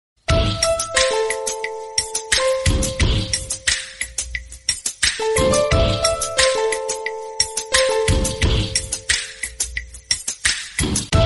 love bgm ringtone south indian bgm ringtone tamil ringtone famous tamil bgm tone